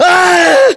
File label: death